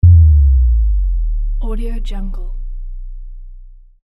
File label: bass drop; dicecca